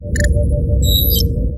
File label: ambient bridge, computer